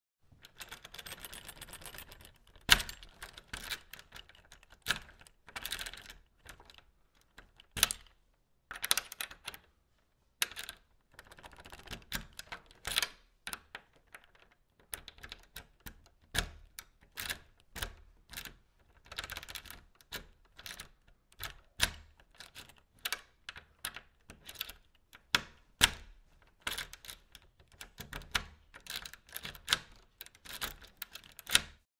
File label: Key In Lock Sound Effect for Doors